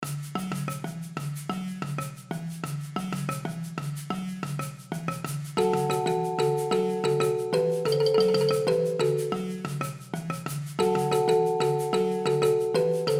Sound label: samba; mermaid adventures the frozen time